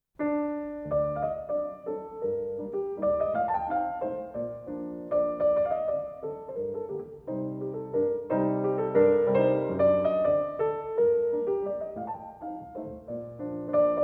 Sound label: Mazurka in G minor, Piano